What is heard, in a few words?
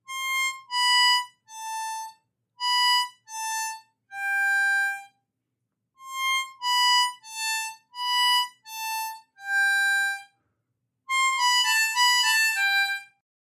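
Triplets Descending, harmonica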